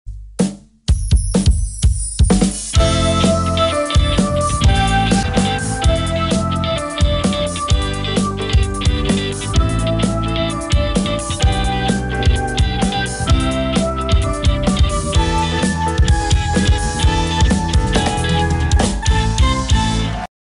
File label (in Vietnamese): nhạc nền trò chơi âm nhạc